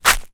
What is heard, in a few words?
foley footstep grit gravel path